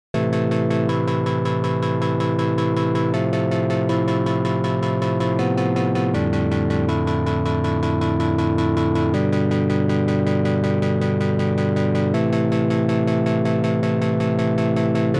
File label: Arp ambient; Beepstreet Dagger